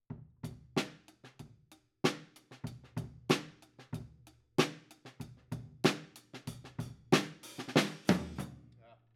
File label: Kit, 94bpm